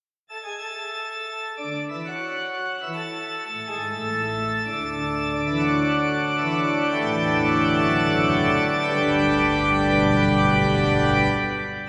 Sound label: Italy Organ; rise of venice windows gamerip 2013